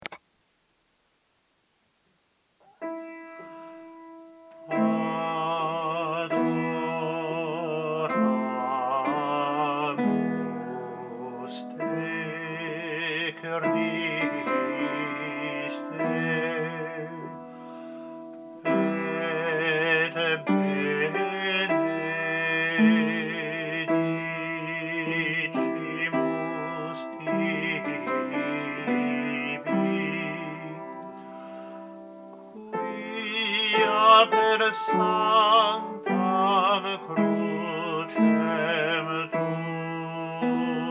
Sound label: adoramus te alto; palestrina